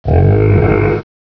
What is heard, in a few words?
ambi, jungle; gearbox